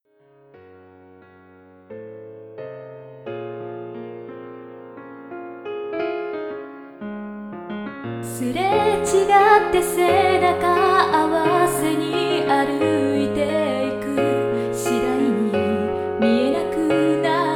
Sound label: metronome